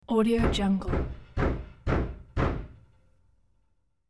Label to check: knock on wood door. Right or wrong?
Right